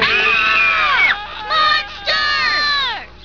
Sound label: aaahh monster